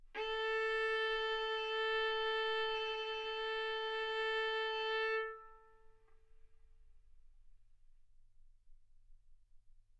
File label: Violin arco mf sul A A4 B4 stereo